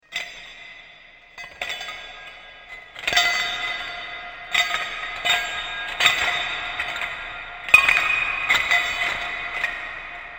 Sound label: Spooky Haunted Chains; Ghost Sound Effects; horror sound effect